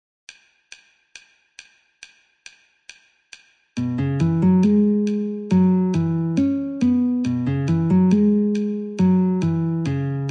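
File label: E Guitar metronome 69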